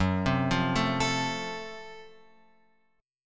F sharp Minor Major 7th Flat 5th F sharp; F#mM7b5 Chord {2 3 3 2 X 5} arpeggiated

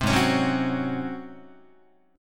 Ab 11th Ab Guitar; strummed; JGuitar

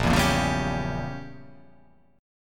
A sharp Diminished 7th, chord

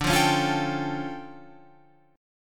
D Minor Major 11th down Guitar Open F; DmM11 strummed; JGuitar

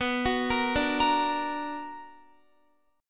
B Minor Major 7th, Guitar; arpeggiated; 4E,4A,5D,5G,5C,6F

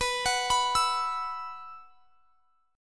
B Suspended 4th; Bsus4; arpeggiated; x,x,21,23,24,24

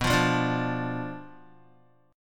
B Diminished B down Guitar; strummed; JGuitar

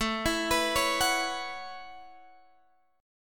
A 6th Add 9th, Guitar, Open G

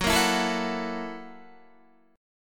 Gb 7th Sharp 9th Gb Guitar; Gb7#9 strummed

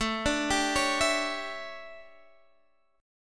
D Major 7th Suspended 2nd Suspended 4th A, chord

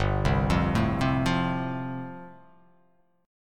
G 7th Suspended 2nd Suspended 4th G

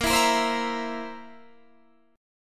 Bb Suspended 2nd Flat 5th Bb down Guitar Standard; strummed

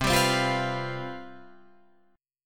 C Major 7th Suspended 4th, down, Guitar, Drop D

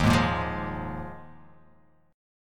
D sharp Suspended 2nd Suspended 4th D sharp

